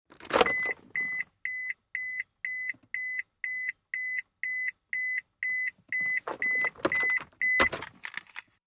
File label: Montero Key Beeps, 1998 mitsubishi montero int.